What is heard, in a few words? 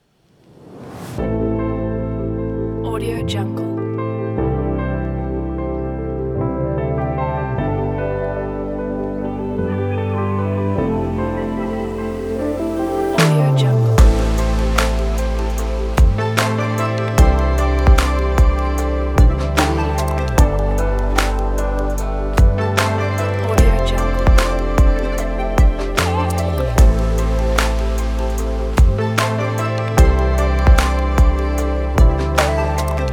asian pack